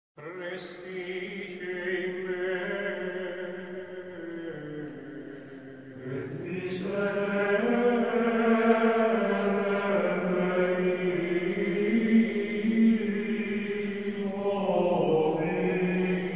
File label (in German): Ambrosianischer Choral, Gregorian chant; Missarum; Ingressa; Respice in